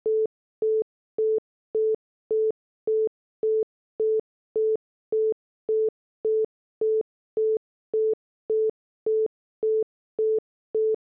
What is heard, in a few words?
tone